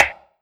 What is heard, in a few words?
drum hit normal; 《Span-sz》 Monko Karthy